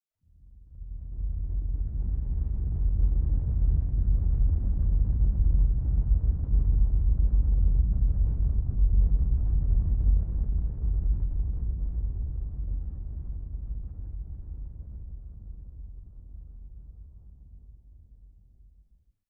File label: Giant Floor Panel Arrive LFE; Boomtown; Sideways Town; Titanfall 2